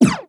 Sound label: space gun